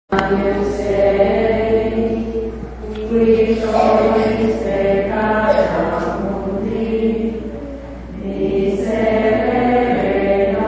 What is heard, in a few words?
voice song; Vacation Faith Camp 2015